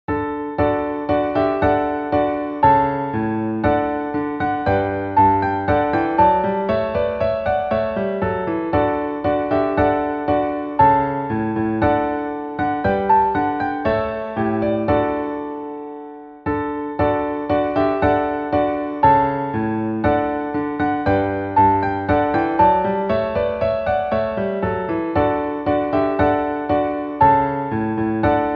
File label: Prelude Motet Te Deum extract; Charpentier M.